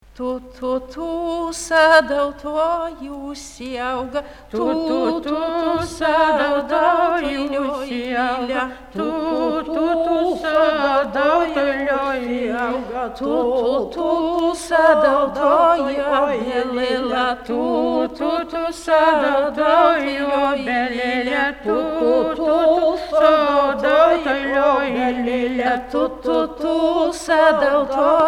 lithuanian folk songs

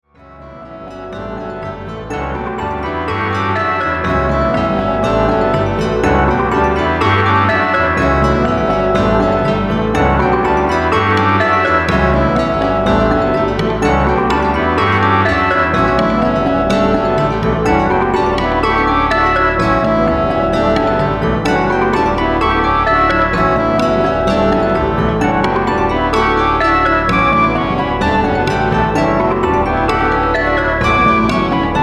rimarimba

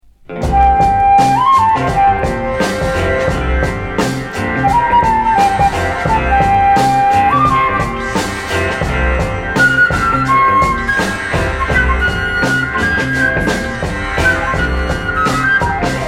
hymns a swinging

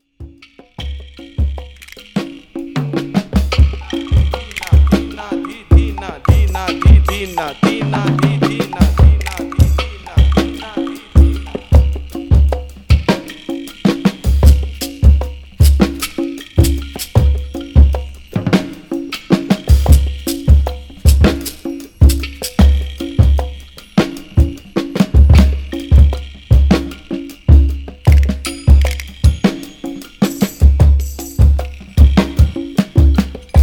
family of percussion

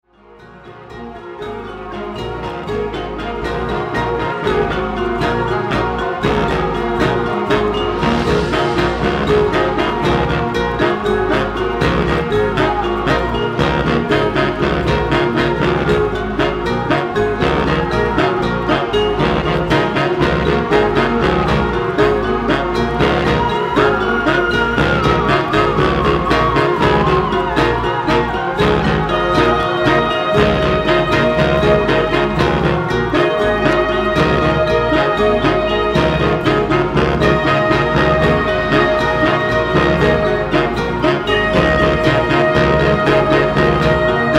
urban sax

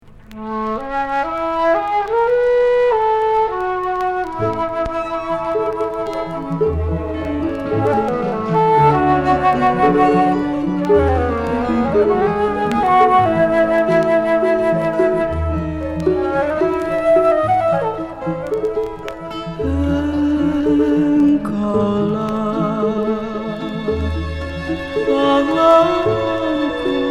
krontjong beat

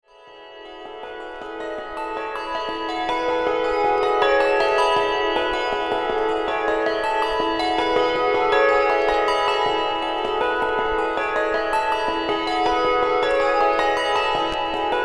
change ringing

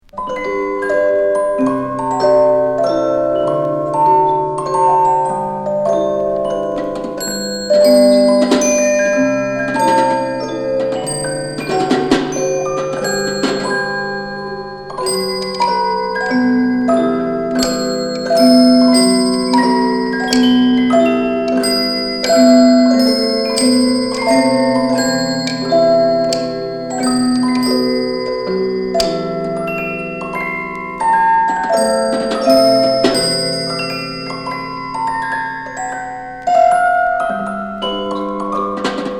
mechanical musical

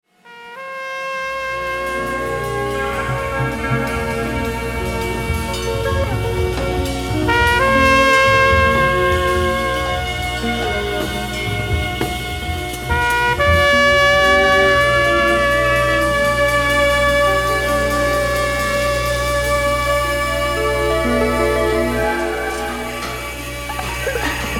rava string band

